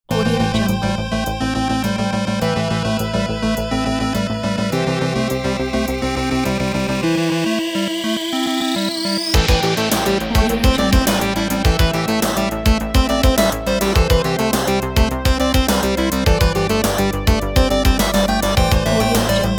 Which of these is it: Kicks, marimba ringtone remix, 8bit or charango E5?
8bit